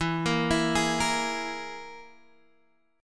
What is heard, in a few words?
E Major Flat 5th E, Guitar, Open G; chord shape